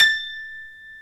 GRAND PIANO; OMI - Universe of Sounds

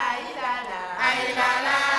CHANT, 120

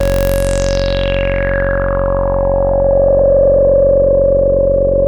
MOOG, BASSES

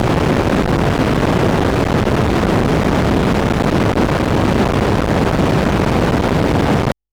Modular Raw, White Noise Raw; noise hiss crackle

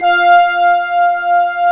PAD, SYN PAD

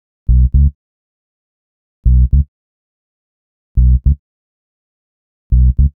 Bass, Cinematic Funk Urban; Sonic Foundry (Sony Creative Software); Cinematic Funk Urban Tone Poems

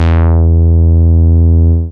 BAS MINI, MOOG; Sound & Vision - Gigapack II CD 1

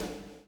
PULL, Backbeat; Spectrasonics - Backbeat

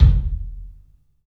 KIK, LIVE CLUB KK, Drums; 3D Sound

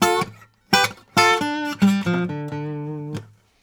Blues Guitar; ILIO; Fretworks; LICK

